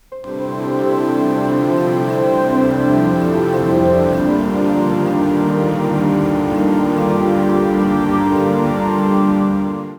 brand intro calm

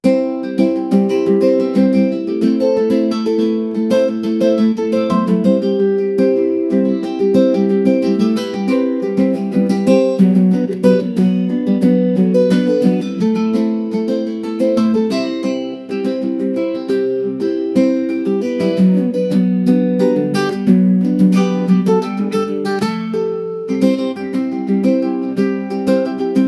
amazing guitar playing, romance

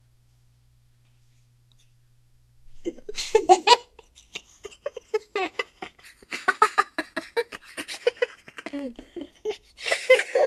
the giantess laughs steps; cartoon

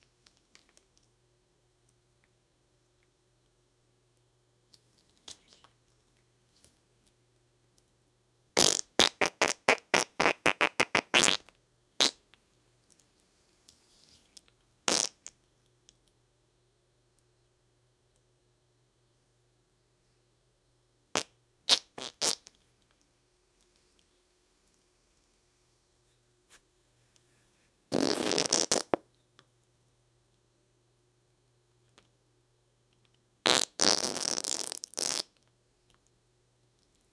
farting; diarrhea; gross

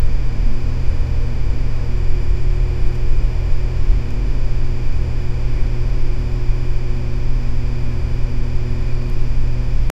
deep low frequency hum of, empty space

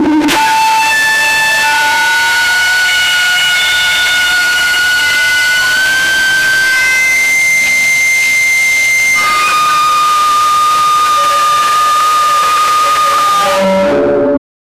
guttural screaming death; sound effect

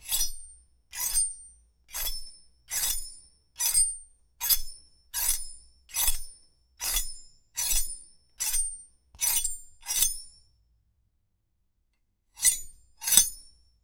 knife swing metal, melee

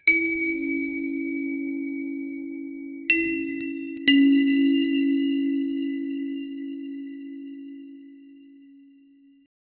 create an original chime, music box

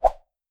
air, sound-effects

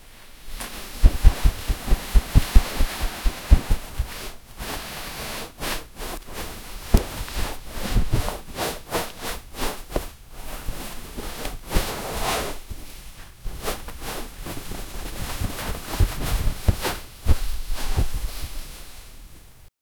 rustling of clothes durin; clothing

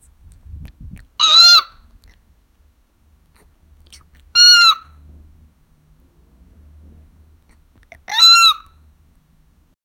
snoring and meowing adora, cats

